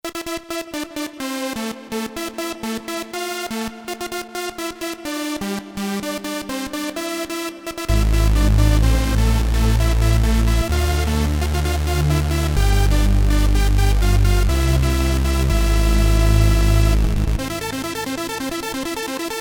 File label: JP-8000 Super Saw; Roland